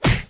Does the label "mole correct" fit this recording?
Yes